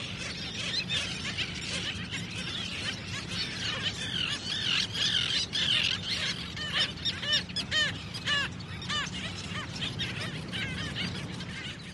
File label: Seabirds At Oceans Edge